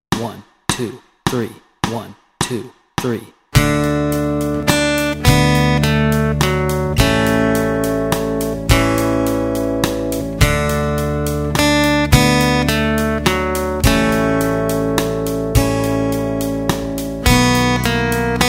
Take Me Out to the Ball Game Melody and Chords Track, guitar